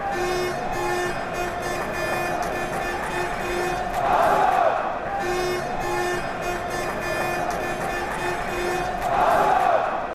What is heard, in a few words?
arsenal horn